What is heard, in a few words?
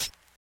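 hi-hat, closed hats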